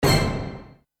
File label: Triton hit ohchestra Hit